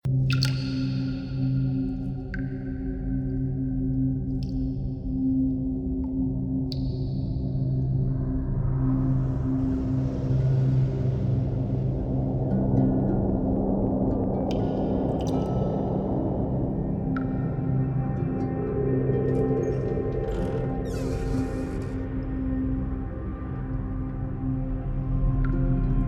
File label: ambient; Great Book Of Magic; Wazdan